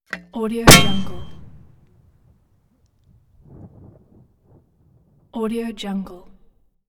mortar launch and impact

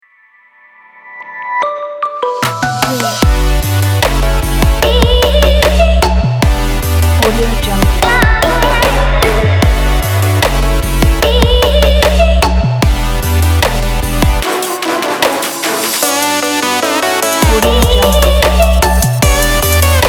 a future bass

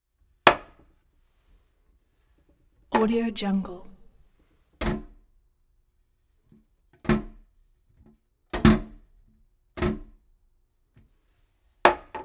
toilet lid open close; collanderson

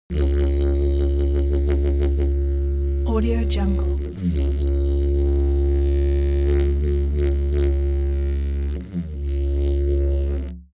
musical sequence didgeridoo single tone sequence

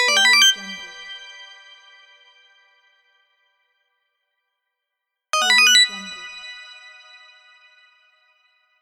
correct answers

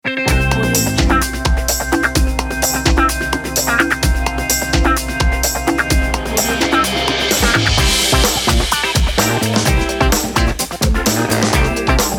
energetic happy boogie; hidroman

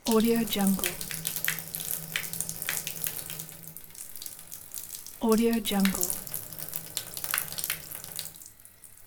sink pipe water drop sound

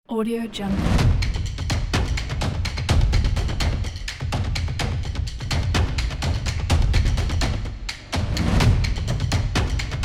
industrial drum

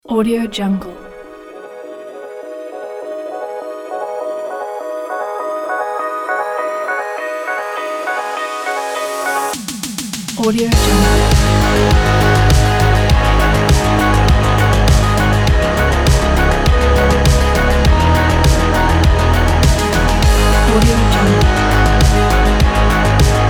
energy sport synthwave

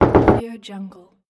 knock on door

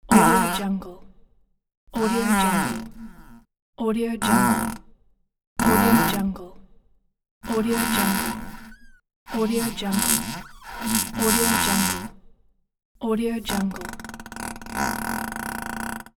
farts

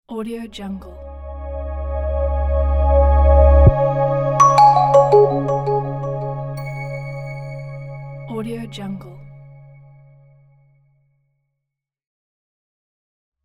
minimal modern logo

